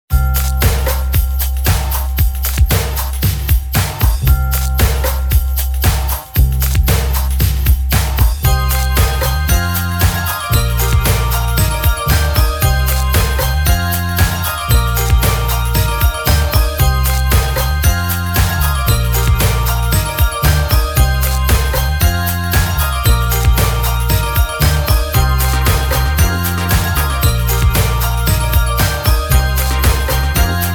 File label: Winter Pop Breakbeat